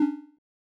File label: error